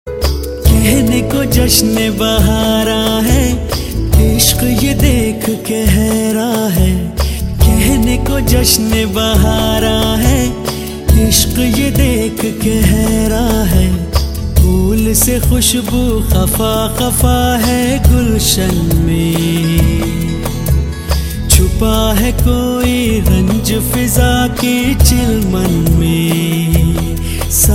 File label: Hindi Ringtone